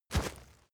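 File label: step leaves wet heavy, footsteps; schritte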